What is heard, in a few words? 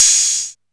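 hat open, Alesis DM5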